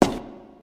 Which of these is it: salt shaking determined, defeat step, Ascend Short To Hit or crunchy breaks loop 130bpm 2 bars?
defeat step